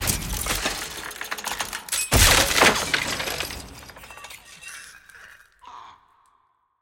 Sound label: sfx trans collection explosion click; jinx